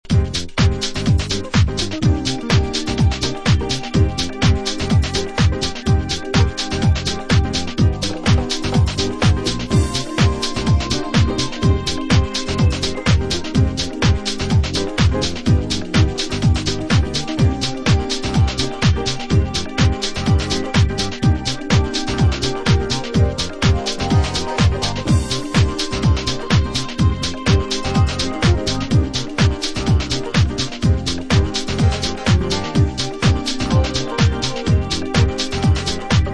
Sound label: Instrumental